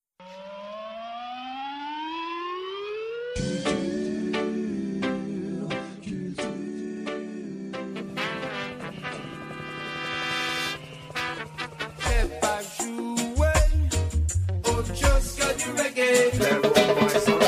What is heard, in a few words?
REGGAE STREAM